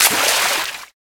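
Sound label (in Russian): шумный всплеск от небольшого предмета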